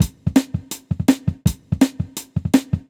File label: 165, Jungle Breaks